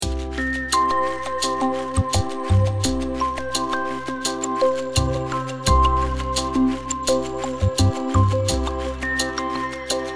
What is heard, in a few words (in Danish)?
Sangbank Mester Jakob Minus vokal